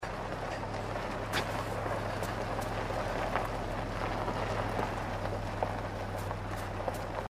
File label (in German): auto; inside